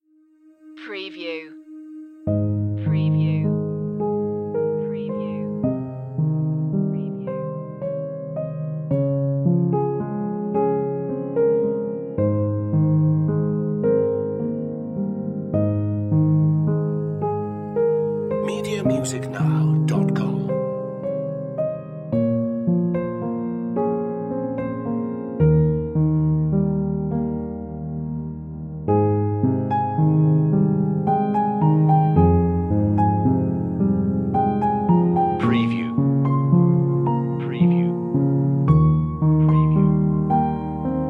emotions, Romantic Nostalgic / Thoughtful, A soft piano tune with a vocal pad background, creat